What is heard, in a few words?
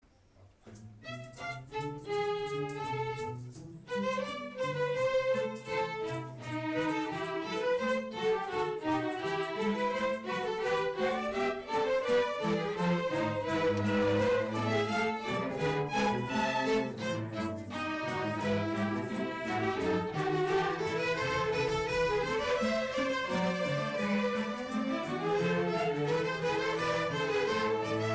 Bach Fugue in A minor